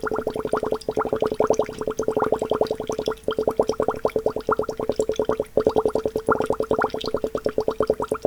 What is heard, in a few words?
Water Bubbles Bong Loop; SFX Kit; Foley - Misc